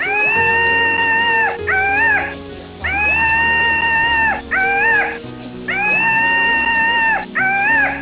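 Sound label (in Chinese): houzi, monkey; animal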